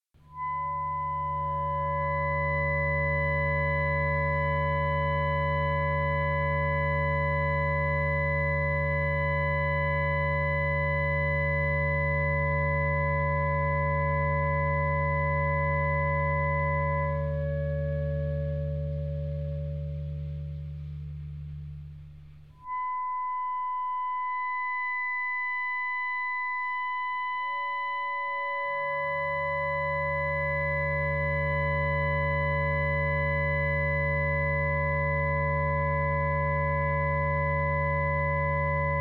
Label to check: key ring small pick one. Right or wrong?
Wrong